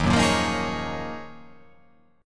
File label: D sharp Minor 11th D sharp, chord